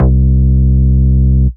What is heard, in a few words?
Ultimate Bass Root C; Vengeance Samples